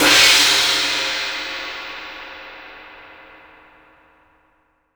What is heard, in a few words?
CYM HIT; Sounds Good - Roots Reggae